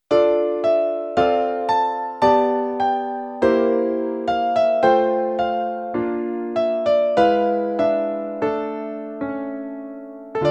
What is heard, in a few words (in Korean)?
piano ver 멜로디MR